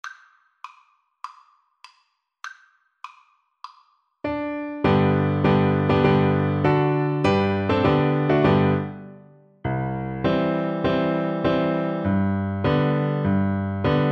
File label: march, Cello